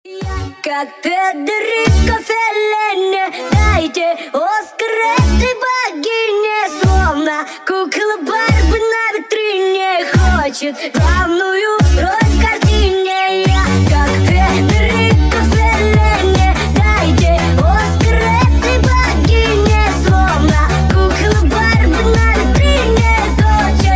pitched version

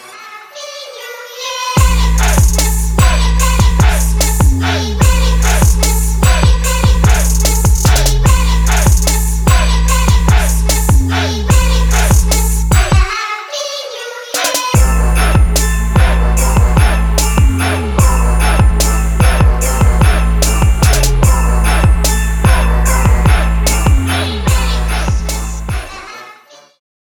merry christmas trap

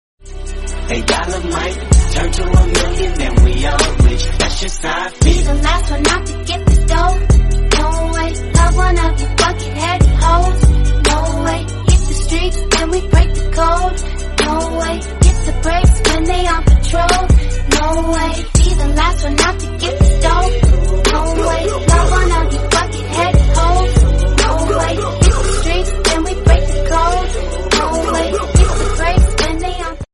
english song

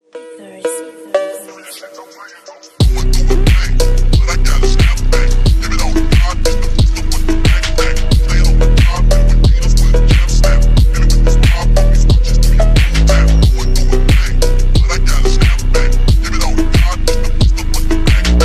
slowed phonk

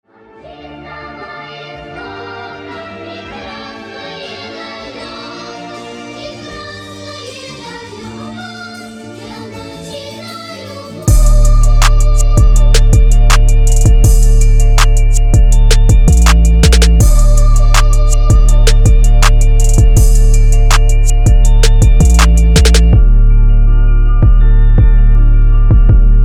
instrumental

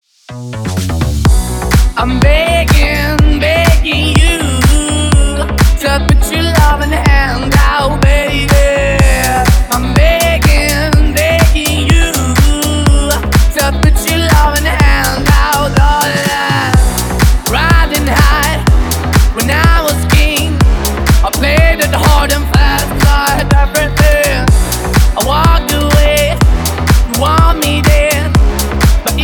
remix

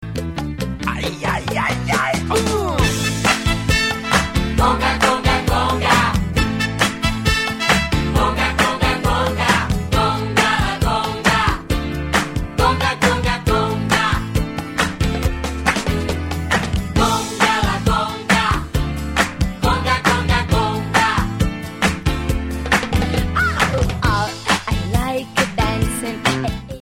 conga conga

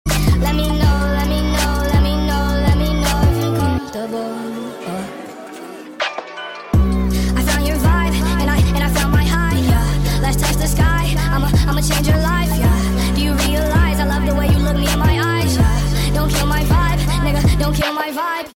sped up songs

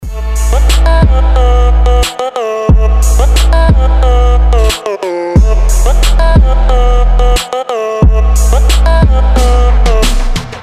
turkish trap

